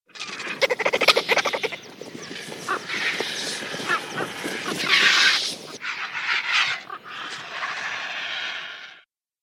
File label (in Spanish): buitre leonado